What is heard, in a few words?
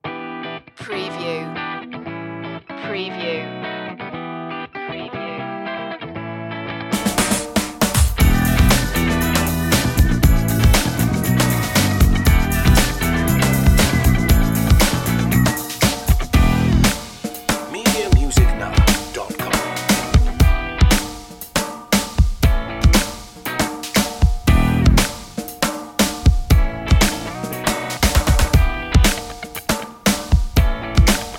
the funky one underscore